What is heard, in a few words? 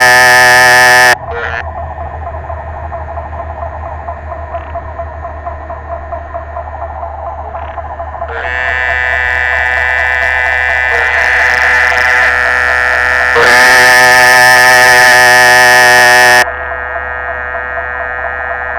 Dermatonotus muelleri